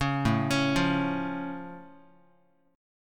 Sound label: A Augmented A down Guitar; arpeggiated; 4,5,4,3,x,x; JGuitar